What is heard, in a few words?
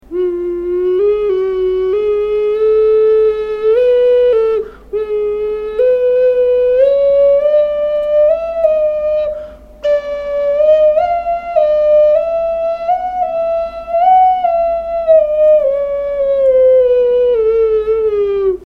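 ocarina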